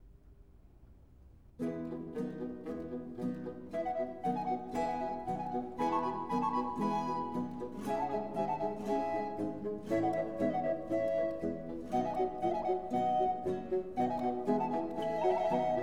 A symphony of Pipes imitating the chirping of Birds; Timon of Athens